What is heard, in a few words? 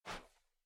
step; snakeinabox